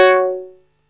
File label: QUINT F#4; Best Service Alpha Dance vol.2; QUINT SEQ